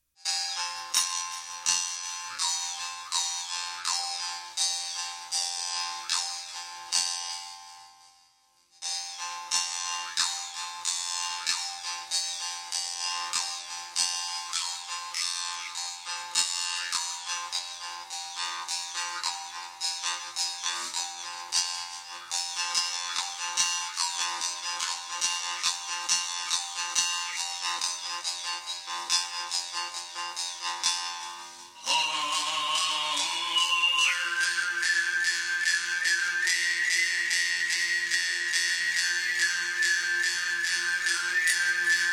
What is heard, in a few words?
khomus; quirk